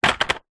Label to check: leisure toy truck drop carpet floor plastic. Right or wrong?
Right